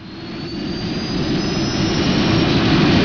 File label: turbo; svencoop; hc2